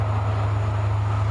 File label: Pielstick run; finnish